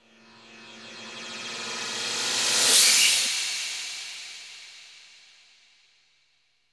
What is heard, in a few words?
RIPCORD; Distored Reality